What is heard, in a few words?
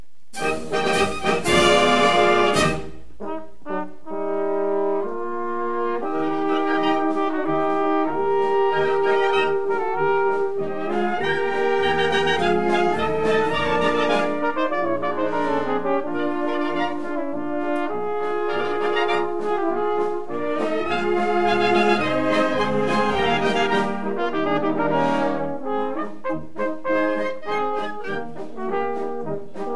Polka